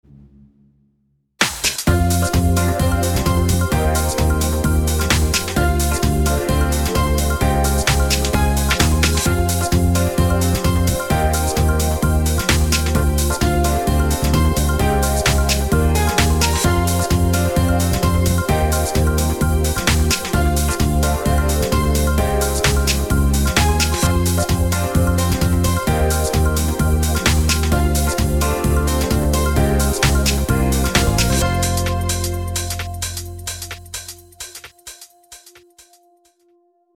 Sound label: loop130